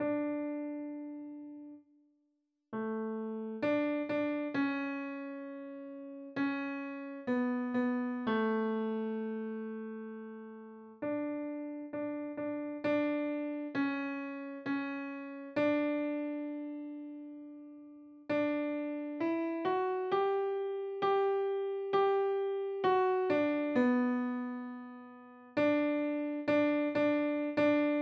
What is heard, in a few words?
Piano Alto